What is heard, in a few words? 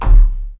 death of the enemy; Rose Dash